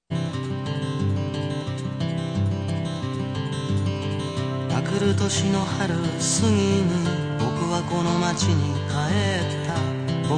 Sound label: nigun